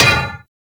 Melee Hammer Impact Generic; Hammers

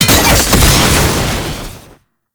Cannister Ignite; Enemies; Abilities; Warframe; Tau; Dax Archer

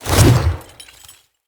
Claw Swipe; Bromius

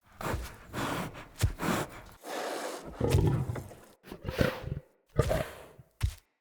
Wolf Idle Sniff; Vocals; Animals

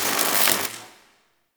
Elec Spark; Warframe; Nokko Colony; Ambience

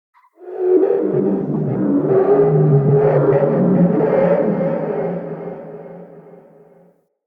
Eerie Texture; Ambience; Red Night

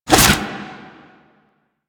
Rifle Swing; Lasrian Lancer Rifle; Lasrian Soldiers; Weapons

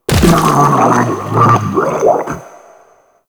Inked Humanoid Large Death; Enemies; Warframe; Shadowgrapher

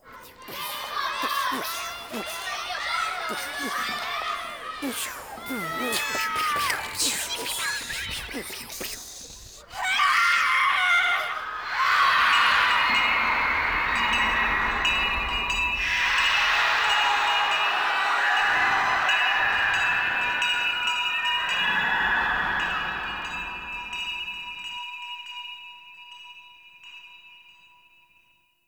Landing Walla; Cinematics; Cetus Landing

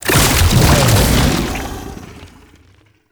Underground Attack Cast; Warframe; Abilities

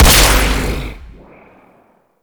Experiment Riffle Shot, Weapons; Warframe; Corpus; Sent Experimental Riffle

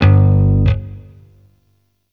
Shawzin Oct One G; Warframe; Sentient Shawzin

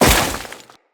Crewman Step Veg, Footsteps; Enemies; Corpus

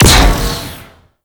Alt Shot, Weapons; BE Tetra

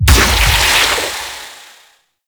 Health Leech Activate; Warframe; Eximus

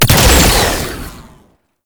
Shield Drone Explosion; Enemies; Abilities; Warframe; Tau; Dax Medic

